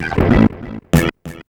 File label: FX, Hip Hop R n B; USB Soundscan vol.19; AKAI